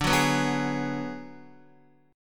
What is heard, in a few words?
D 6th Flat 5th, Guitar; D6b5 strummed; JGuitar